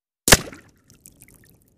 Bullet Impacts Water

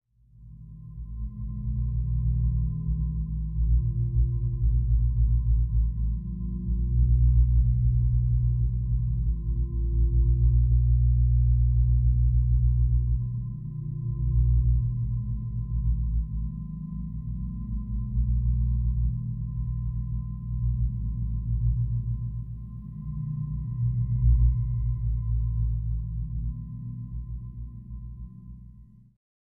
Lowpitched Synth Ton, synth tones

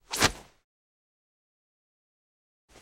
Nylon Swishes Quick, whooshes & swishes

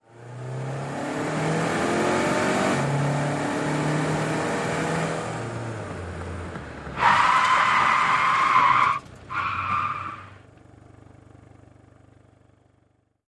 Sedan Accel Tire Skd

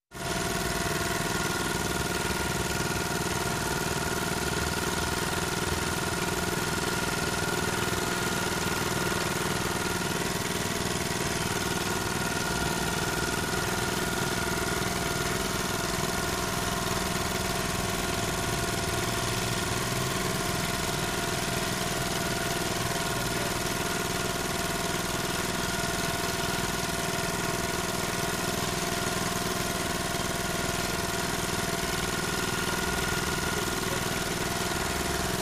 Edge Trimmer Steady; 2 Horsepower Briggs And Stratton, With Medium R.P.M.

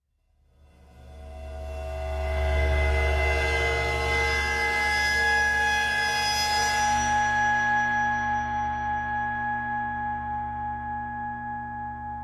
Old Ride Cymbol Bowed

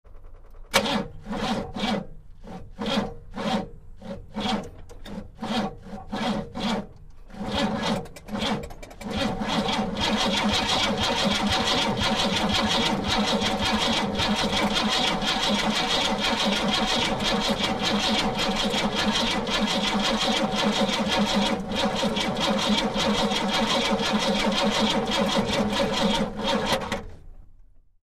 Car False Start; car various

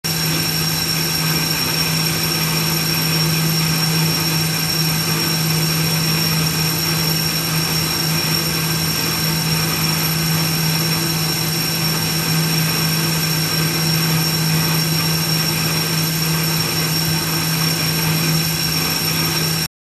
Air Hiss Mechanical

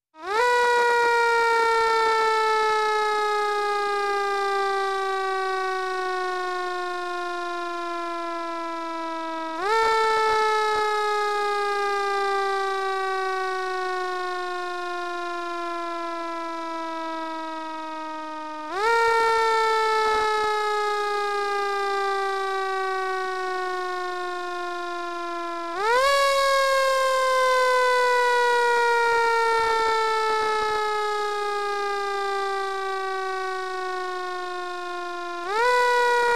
Federal Air Horn Sire, air horns

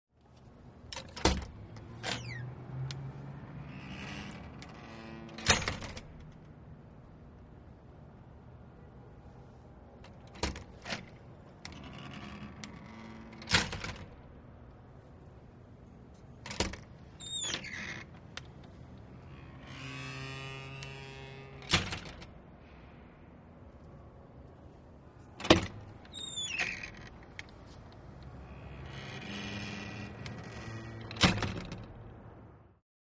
Door Open Close Indus, push bar

